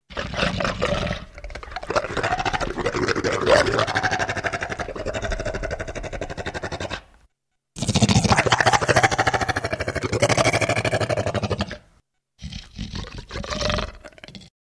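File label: Throaty Growl Saliva, vocal elements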